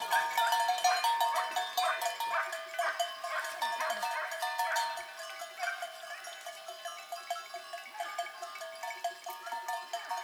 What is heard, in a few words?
Meteora Soundscape